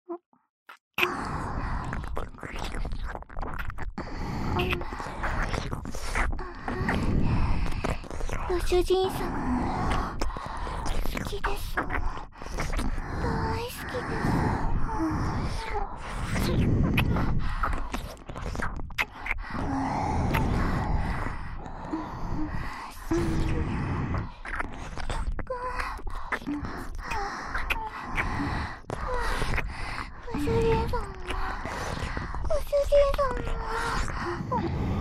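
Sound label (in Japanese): しゅきしゅき両耳舐めループ; 3WAYループ; ぐっちゅぐちゅ耳舐め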